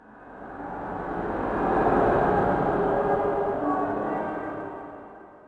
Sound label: city, zones; Zoom3City